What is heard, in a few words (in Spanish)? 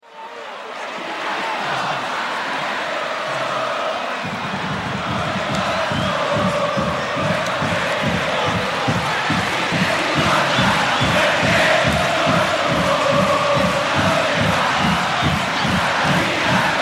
futbol; ciudad de mendoza; godoy cruz